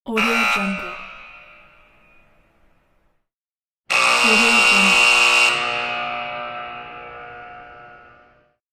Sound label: ice hockey goal siren